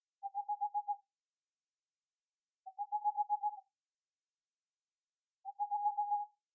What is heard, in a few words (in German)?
raufusskauz